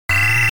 Firing; arcade; Dig Dug; Figer Firing

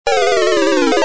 Death, arcade; Dig Dug

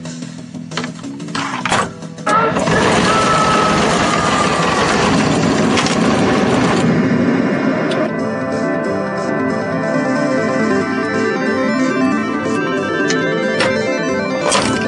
Earthshaker close up; arcade; IE enginearing

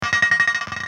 coin Drop; qbert

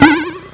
Bump person

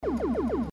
Pew Pew Pew; SpaceDuel

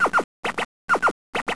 WALK

